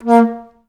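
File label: staccato A#2; Best Service; Peter Siedlaczek's Advanced Orchestra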